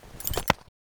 knife push look at end; weapons; Counter Strike Model Pack; CS2

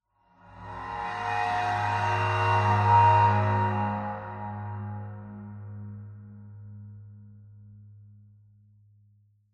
cymbal high, ambient; cymbals

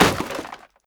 break cardboard box small; physics; breaking

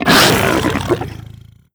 grab react player, barnacle; Half Life Alyx

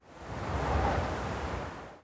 wind gust

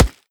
cardboard, bullet impacts; physics; Half Life Model Pack

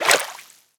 water entry; ambient

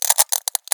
glass strain; physics

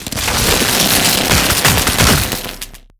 shockwave tell

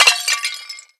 glass pottery large break; physics

